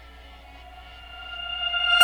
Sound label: Farfisa organ